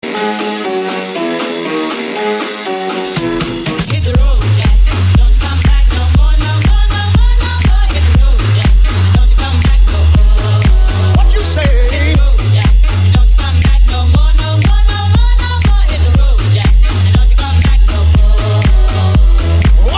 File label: house hit, ringtones, electronic